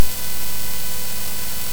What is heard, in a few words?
sid CSG8580R5, GAMES; Artillery Duel